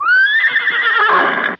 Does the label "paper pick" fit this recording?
No